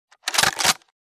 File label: Sniper rifle manual reload